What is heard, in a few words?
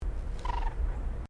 evelynae dive